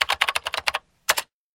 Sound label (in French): clavier mot